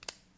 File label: click; outfly